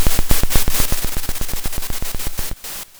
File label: pop, Sound of a drum roll